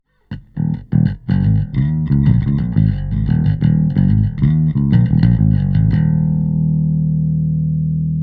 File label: Bass; VLA-2A Mark II